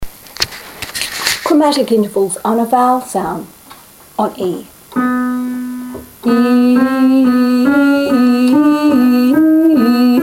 Chromatic intervals on ee (with pivot note B) asc & desc, Singing Exercises